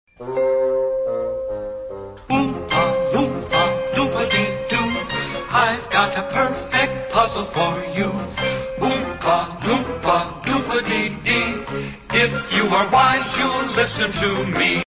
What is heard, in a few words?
ringtones